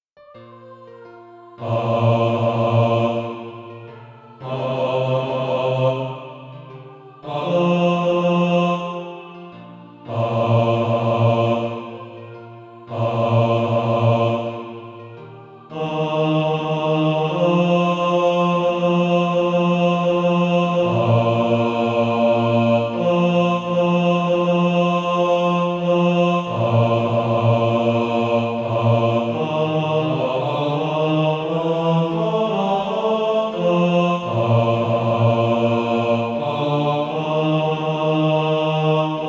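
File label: bass; Calvary Darwood Sheet Music (SATB)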